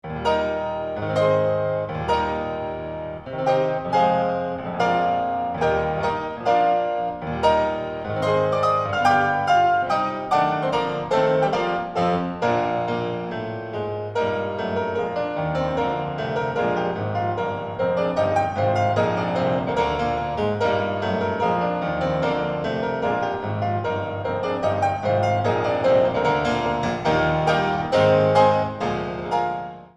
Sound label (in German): Schumann Fantasie C-Dur; 2. Massig. Durchaus Energisch